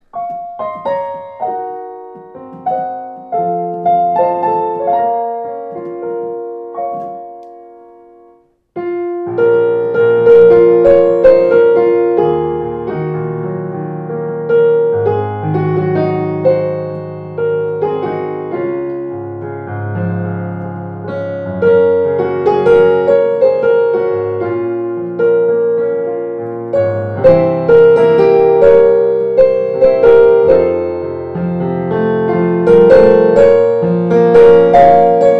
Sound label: fortepiano; A. Ahmatova